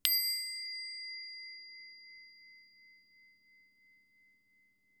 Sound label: smallbowl top sound